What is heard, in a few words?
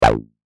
Acid Psytrance